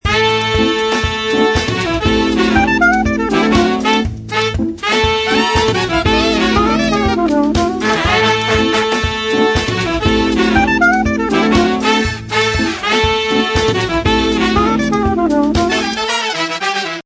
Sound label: Ring Trumpet, phonetones; Asus P526